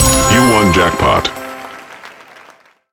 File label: jackpot, roulette; wbg games